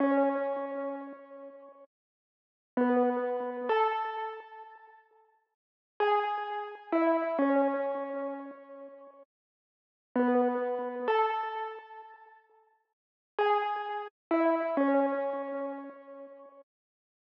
Sad Melody Loop - 130 BPM F# Min Plucks; Cymatics; Oracle Sample Pack

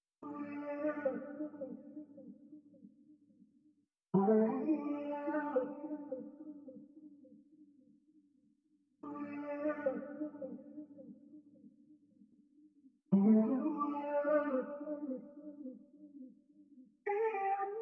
109 BPM D Min Vocal, Afrobeats; Melodies; Loop Stems; Cymatics; GHOST (Beta Pack); Bembe

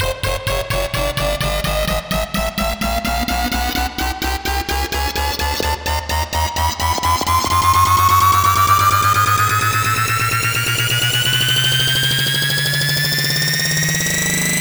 House Riser 128 BPM F; FX; Risers

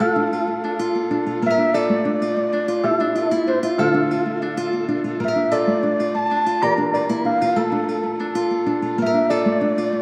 Cult, Hip Hop Melodies, World; 127 BPM D Min

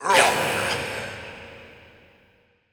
Processed Battle Shouts, Viking Vocal

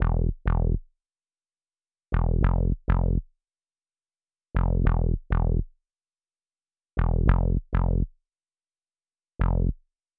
West Coast Melody Loop - 99 BPM A Min Bass; Melodies; Loop Stems; Cymatics; Oracle Sample Pack

